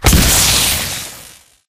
electrical death zap; Titanfall 2

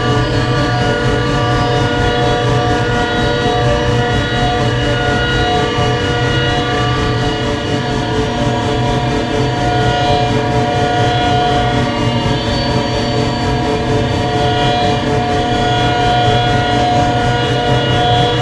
Robot Arm Track Fast Close; Boomtown; Titanfall 2

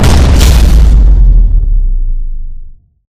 cannon fire; Titanfall 2

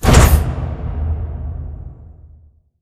sliding door lg verb open stop; beacon; Titanfall 2

